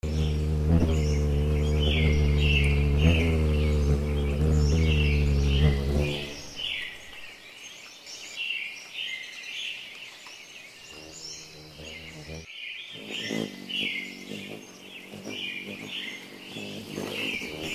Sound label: atmo birds hummingbirds buzzing